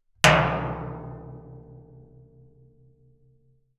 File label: Metal; TOOLKIT vol.2